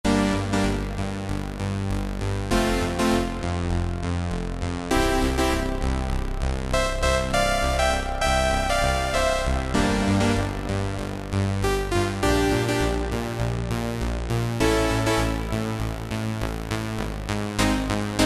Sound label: Waves; Kurzweil K2000; SYNTHS; Kurzweil K2000 Value Pack; Extra Programs